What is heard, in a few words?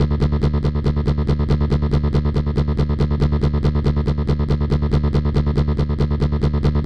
bass loop D 140 bpm